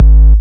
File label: kick, Heartbeats